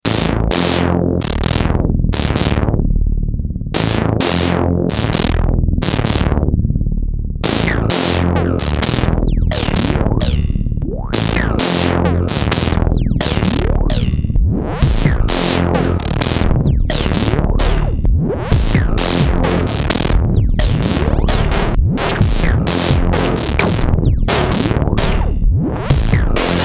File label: supersaw